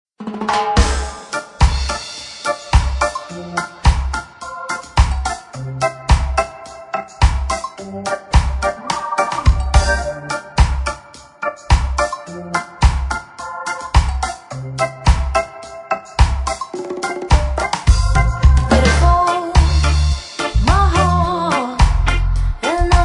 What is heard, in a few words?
Reggae Cover